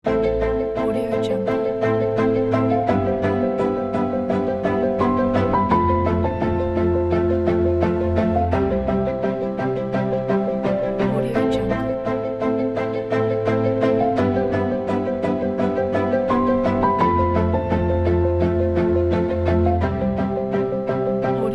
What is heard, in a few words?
a sad beautiful